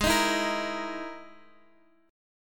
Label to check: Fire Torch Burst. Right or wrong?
Wrong